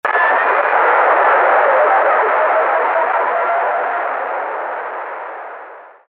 glitch; my talking tom

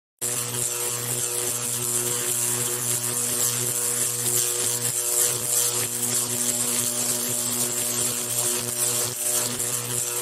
electric crackle; electric shaver

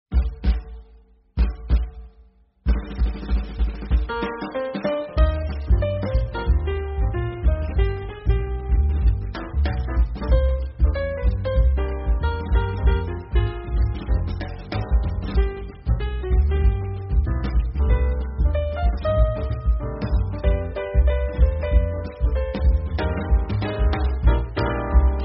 jazz intro

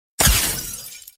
shield crack

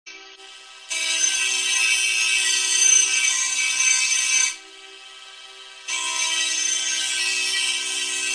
angle grinder vocoded

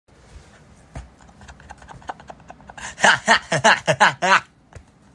laugh

